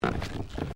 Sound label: Footsteps Stairs Wooden Carpeted Step